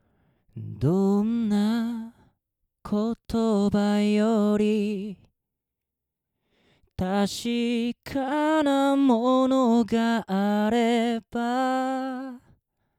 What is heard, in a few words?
SENNHEISER MD421N